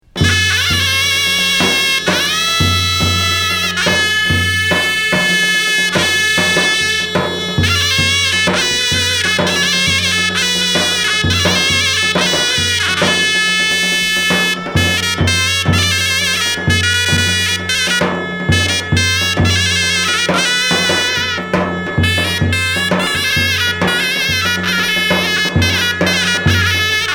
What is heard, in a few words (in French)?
musique grece